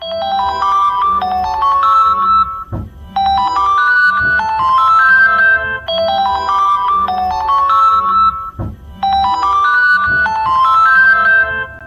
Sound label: oppo find x7 ultra original; nhac chuong